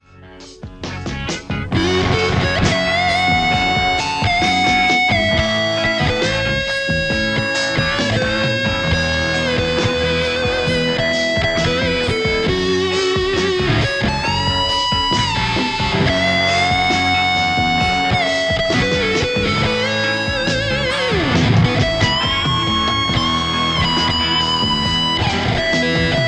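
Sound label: Telecaster; poulin le456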